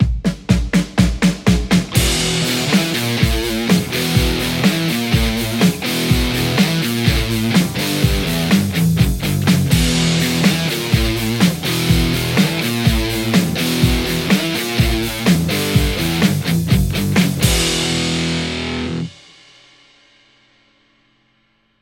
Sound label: Les Paul; poulin lecto